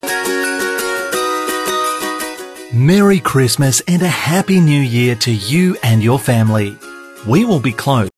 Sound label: Christmas Sound Bite; Captivate Connect